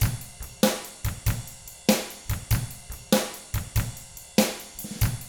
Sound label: rock 6/8, drums; ILIO; Double Platinum Drums 1